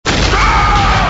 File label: death; hl2dm